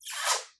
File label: kiss; shweets sim; snivy